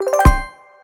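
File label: small win, slots; pork knox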